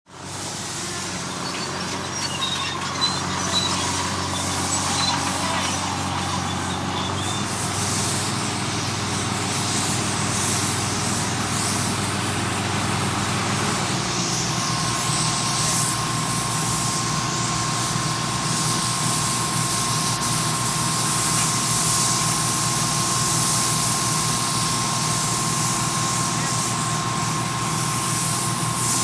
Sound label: CONSTRUCTION & FACTORY Background DEMOLITION SITE: EXT: Compressor in Background, voices, bulldozer and hissing.